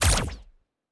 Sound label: Weapon Shoot